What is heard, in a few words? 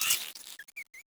critter hit by flare; nightmare criters